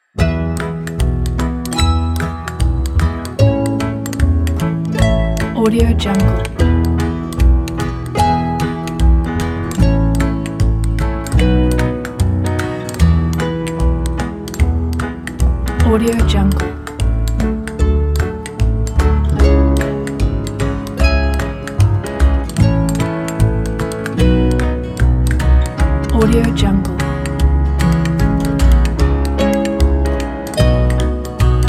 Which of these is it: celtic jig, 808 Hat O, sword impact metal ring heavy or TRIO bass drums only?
celtic jig